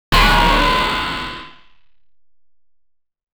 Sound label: sharp futuristic synth